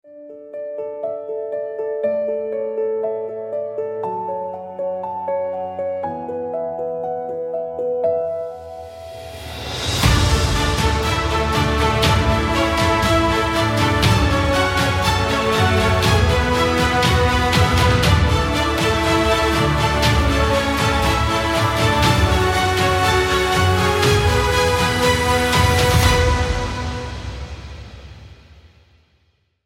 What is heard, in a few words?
heroic ascent theme